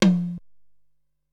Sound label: Dry Tom High, Ensoniq SQ-R-Plus